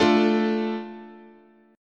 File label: A Suspended 4th Sharp 5th, guitar chord